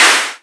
SNARE, dubstep; DRUM HITS; Loopmasters; The New Sound Of Dubstep

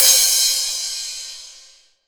Crash, Cymbals

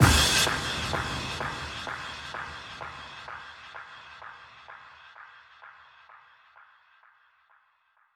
FX Impact

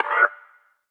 G STAB, funky

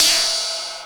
Ride, Dubstep One-Shot Drums

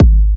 Bassdrum

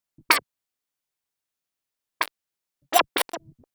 126 D MUSIC; funky; Loopmasters; Funky Underground Vol 2

